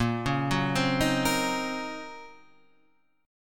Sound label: D sharp Minor 13th A sharp, chord